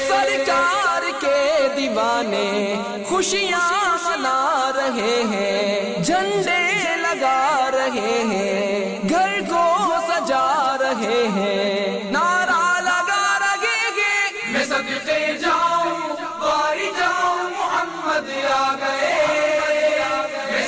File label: Milad Nabi Ringtone